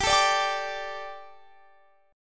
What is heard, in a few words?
G Suspended 2nd; strummed